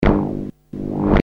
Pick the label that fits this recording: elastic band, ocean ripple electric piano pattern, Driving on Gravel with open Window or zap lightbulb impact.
elastic band